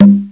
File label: SY35, Yamaha Sy35, Drums